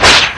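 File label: oicw reload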